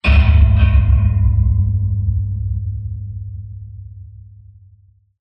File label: structure blunt; Barotrauma; Subsurface